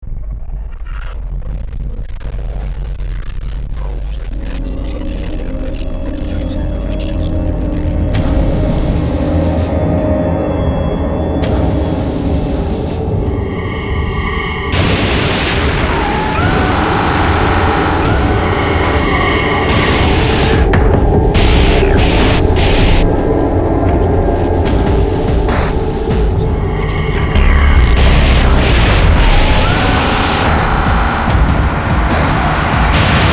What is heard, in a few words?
Noise; Quake 3